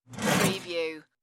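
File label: Cash Register Open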